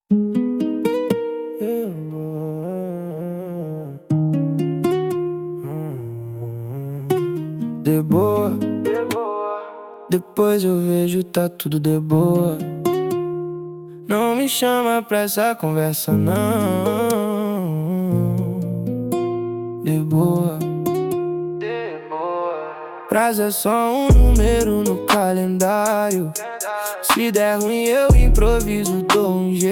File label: jingle